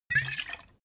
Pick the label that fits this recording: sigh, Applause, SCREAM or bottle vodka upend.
bottle vodka upend